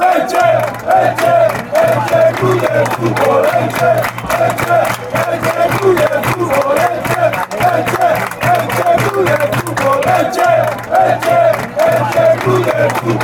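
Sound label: fanchants, football chants; elche club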